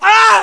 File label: Male Die